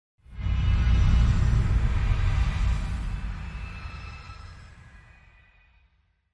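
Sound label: ghostly horror scene transition; Таинственные шепота, стоны и шаги призраков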